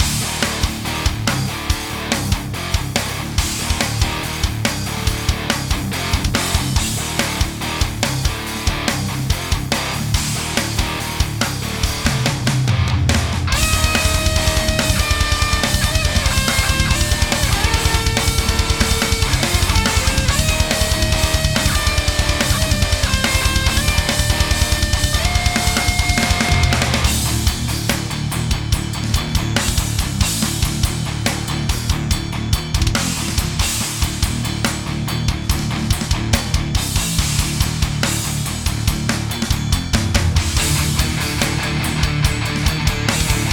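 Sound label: BGM Boss Battle, gamerip; dusk diver 2; ps4 ps5 switch windows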